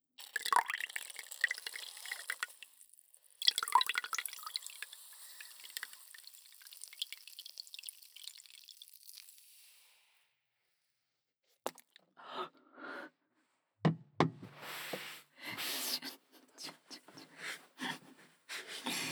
NG スポンジを水に落とす

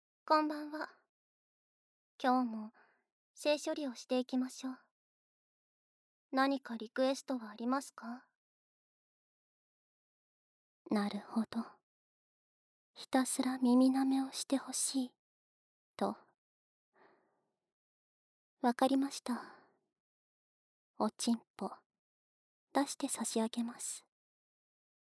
おまけ『ず～っと耳舐めをします』, ASMR; ＳＥ無し

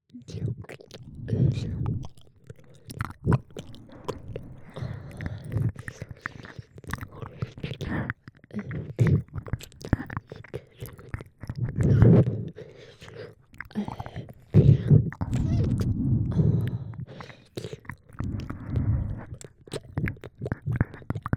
耳舐め, バイノーラル; 『シスターズ ダムネーション -罪深き性転換-』バイノーラル性転換懺悔音声 ふたなり 耳舐め

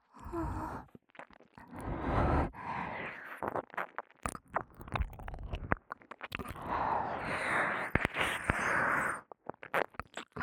(左)耳舐め_吸う; 【舐め音特化】耳の奥底で蠢いて～舐め音に溺れるバイノーラル～; ただ舐め音に溺れる

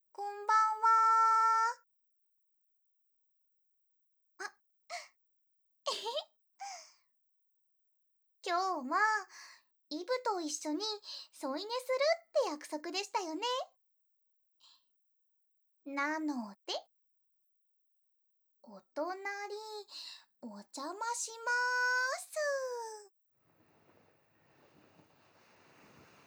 寝息 左; イヴの寝息 左

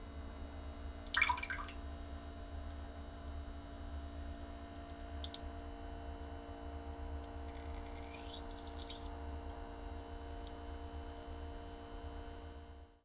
おしっこしょろ音（声なし）; 生撮り！少女のリアルトイレ盗聴

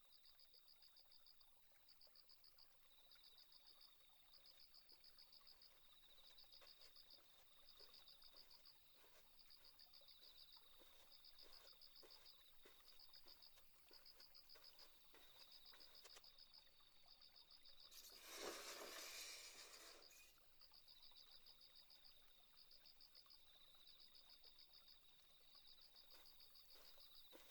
耳かき; 乙女屋敷で癒されませんか? ～あなたに素敵な夢を聞かせてあげます～; かぐや姫の耳かき

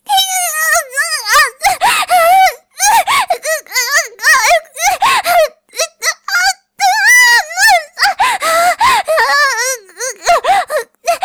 激しく喘ぐ, 凌辱系 音声素材; Honey Drop

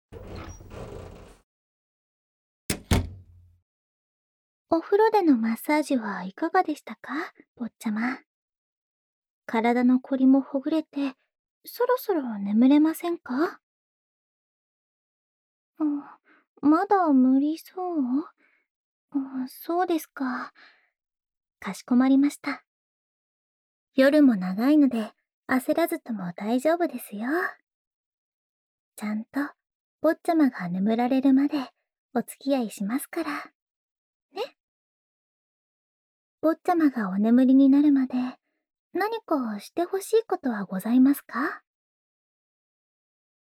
添い寝耳舐め, ASMR

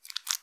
深めにストローク, ピストン運動 激しめ; １ストローク単発

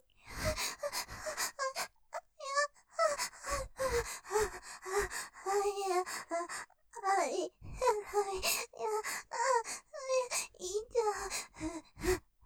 喘ぎ◆さらにふやふやにっなっちゃう；；らめぇ; ステレオ

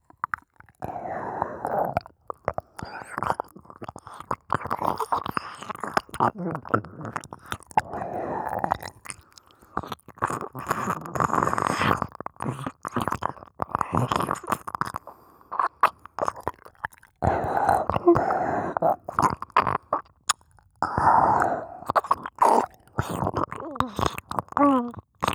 耳舐めオンリー

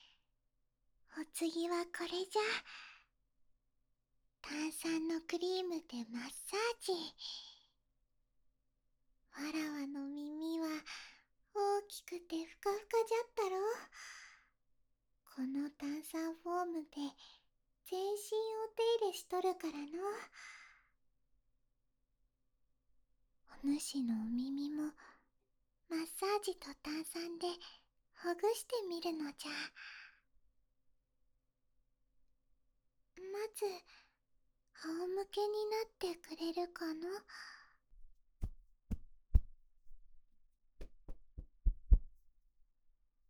マッサージでお耳をほぐしてみるのじゃ【炭酸クリームマッサージ】, ASMR

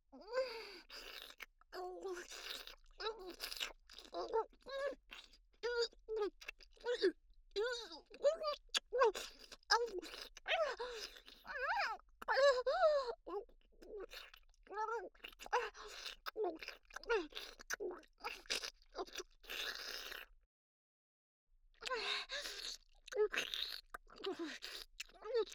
フェラ・激しくフェラをする; おとなし少女系カノジョ

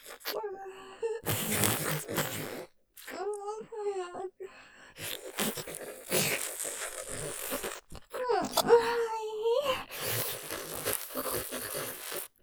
フェラ音マシマシ・さらに激しくバキューム！; 追い込み２; ステレオ

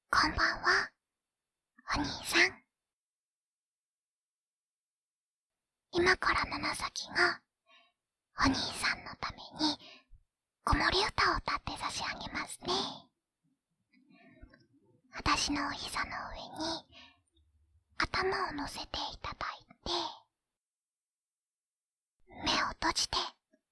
子守唄を歌っちゃいます（VocalVersion）; 七咲

